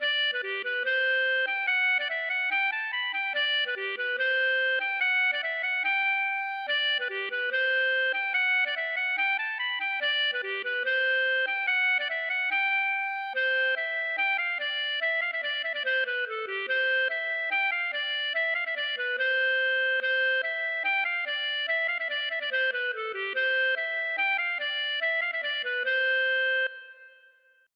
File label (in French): bourree; De Brand